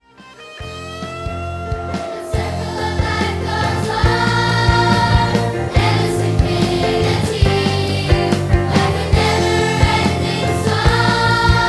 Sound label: live version 3 part song for treble voices in F